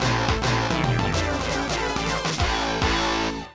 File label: Versus Screen; super street fighter ii turbo hd remix; xbla 2008; x360 gamerip; Classic Arcade Version